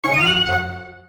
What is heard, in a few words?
Allegro, notifications; Sony